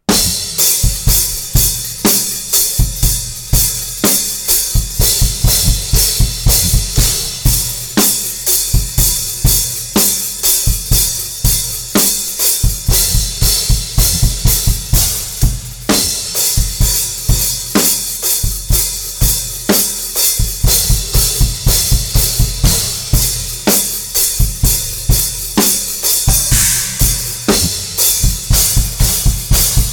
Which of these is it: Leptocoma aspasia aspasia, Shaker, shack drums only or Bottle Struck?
shack drums only